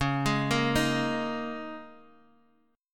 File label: Ab Suspended 2nd Suspended 4th, chord